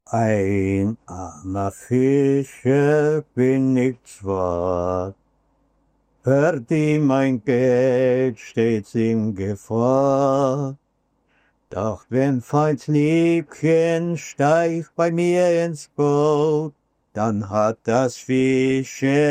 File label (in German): gesang, ein armer fischer